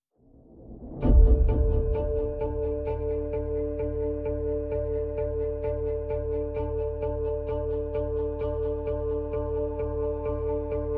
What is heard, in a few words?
Pulses Only